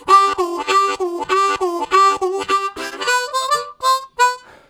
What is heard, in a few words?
Harmonica, WAV Riffs; Zero G